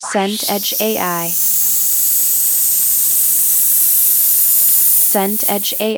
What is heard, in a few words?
tape hiss